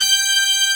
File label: brass G5; AKAI S6000 CD-ROM - Volume 1; BrassWind